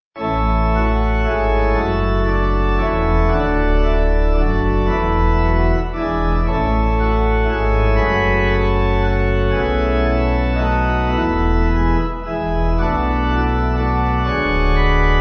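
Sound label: Pipe Organ, Music Backing Files for Churches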